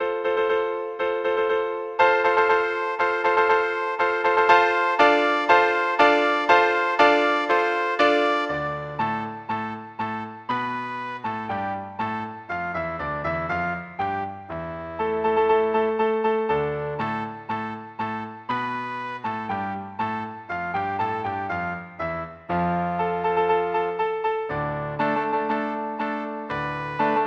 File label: trumpet